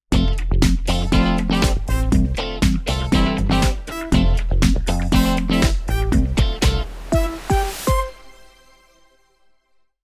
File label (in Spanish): Radio Jingle Corto; good day for a scrap